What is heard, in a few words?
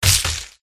hydra attack contact bottom